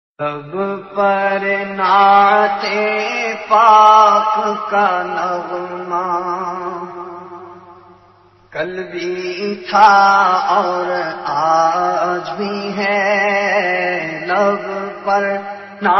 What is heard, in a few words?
Naat